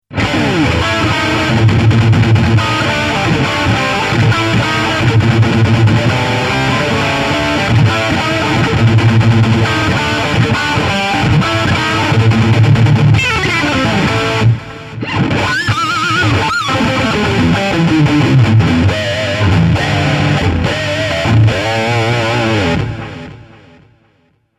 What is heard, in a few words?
Lead Notch